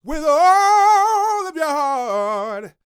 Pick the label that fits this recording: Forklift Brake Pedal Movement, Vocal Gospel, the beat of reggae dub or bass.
Vocal Gospel